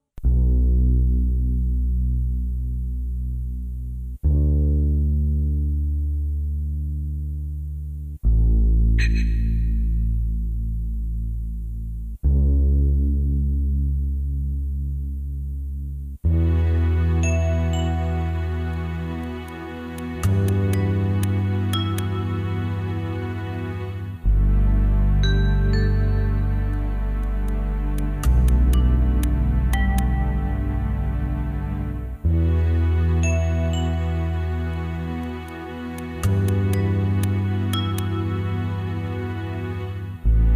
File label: Ambient N Vibe Train